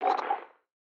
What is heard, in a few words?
night frogs; factorio